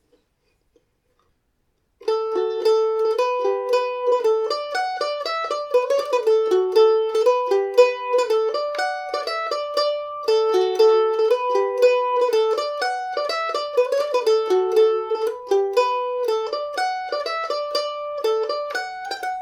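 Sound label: john egans polka D major, mandolin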